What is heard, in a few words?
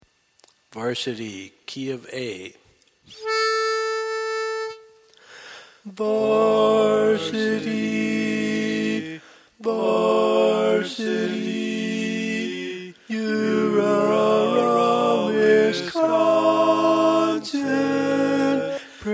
Lead, Learning Tracks